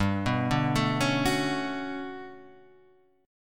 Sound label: G Major Sharp 11th G, chord; GM#11 Chord {3 2 0 2 2 2} arpeggiated